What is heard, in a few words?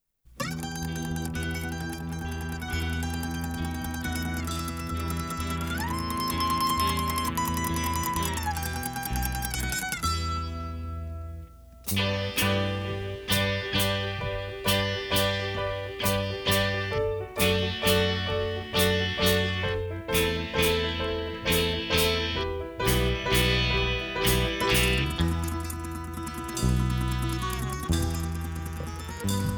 Waltz inst